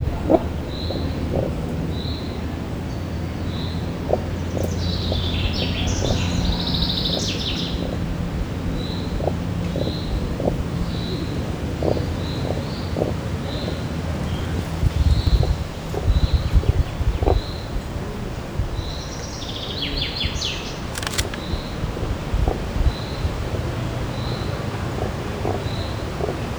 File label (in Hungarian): amuri daru; szegedi zoo 2011